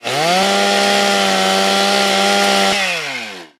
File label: Wpn Ripper fire